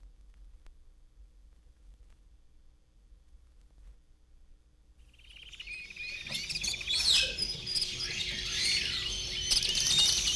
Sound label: Tropical Birds; Jac Holzman; Authentic Sound Effects Volume 2